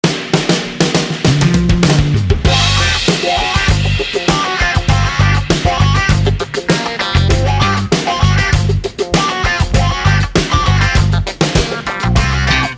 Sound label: Funk; Hyppönen&Enbuske; tunnarit